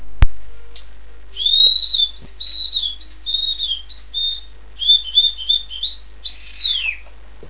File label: jig